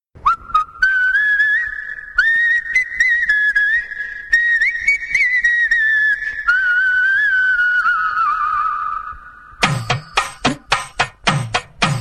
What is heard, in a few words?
Whistle Bgm Ringtone